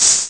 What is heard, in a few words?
spritz